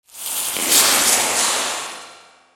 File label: Gate Chamber Earth; ninjago